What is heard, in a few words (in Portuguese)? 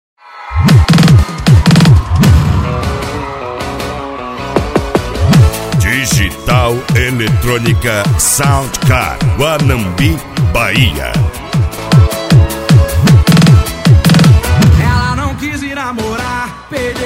pancanejo eletronica sound car; cd pancanejo digital